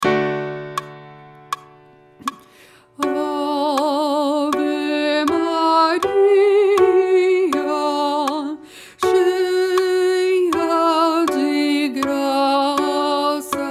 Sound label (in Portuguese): contralto